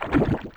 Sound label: Splash